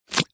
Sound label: cartoon pull wet item squelch; zapsplat